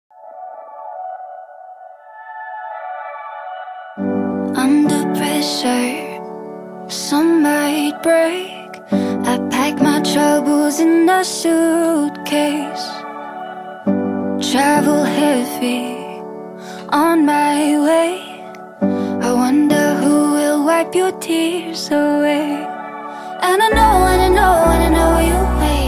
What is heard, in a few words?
sfx y great teen female voice